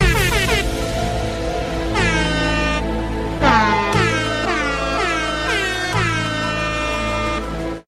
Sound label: airhorn illuminati